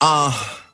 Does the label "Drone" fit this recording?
No